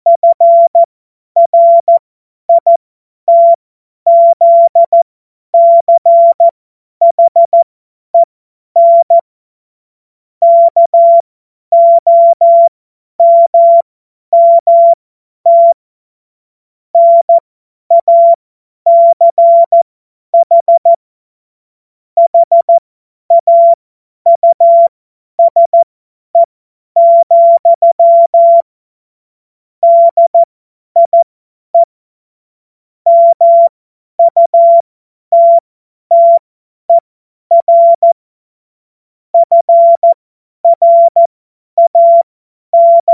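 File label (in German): Witz, Morsekurs, cw morsen; Witze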